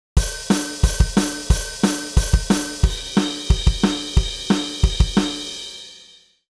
Hihat Open Crash; 耳コピが基礎からできるようになる本

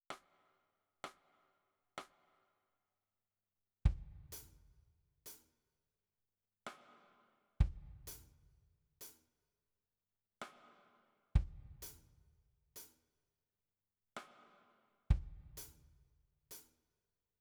Drums

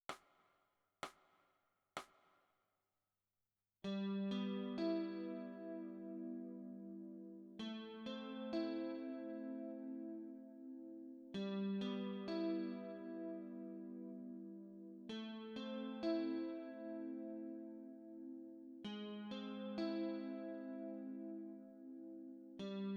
Chorus Guitar

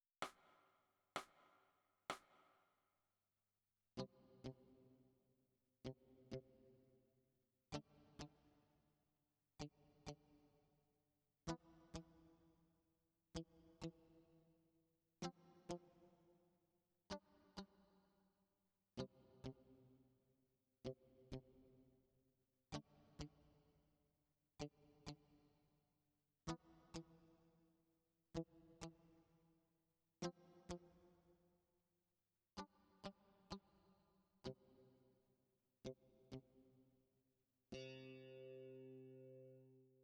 E Guitar

